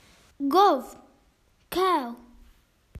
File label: Cow